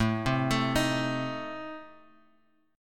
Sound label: A Diminished A, chord shape